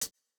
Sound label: Jrock hihat